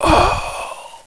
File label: pain; cstrike; bot